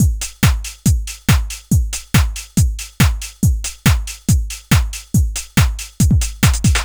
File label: 140 C trance kit; Trance Explosion Vol1